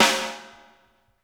AMBIENT B, Drumworks; Sampleheads; New York City Drumworks VOL-1

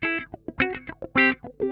LICK, Funk Guitar; 90 s Sample CDs; Zero G

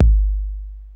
BD; Crypton - DrumBase5000

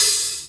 HH CL, Drum 1 Dry; East Collexion

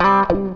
FUNKY, Grooves

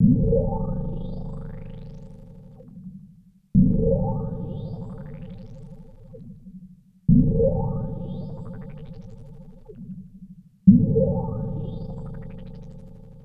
SUBMARI, Analog Fantasies; USB Soundscan vol.49; AKAI

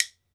WOOD BONE, Celtic Percussion; Drums&Percussion; E-MU Producer Series Vol. 7 – Old World Instruments

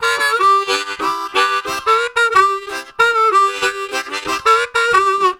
Syncopated, Harmonica Riffs; Zero G

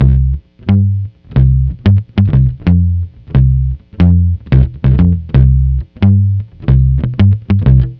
Lounge Bass-C; Zero-G Kocktail Kollection; Construction Kitz (Lounge); Welk Lounge 090

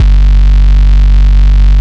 BLOWZY HIGH B, Bass; Distored Reality 1&2

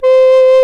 HRP RCDR&FLT

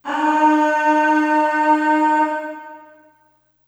Aah Short D#3 Short Reverb; Harmonic Building Blocks

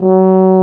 BRS C TUBA; Roland L-CDX-03

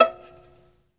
Synclavier Strings, SOLO VI; ILIO

